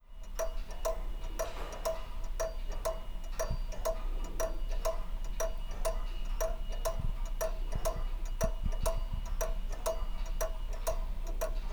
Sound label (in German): Laterndluhr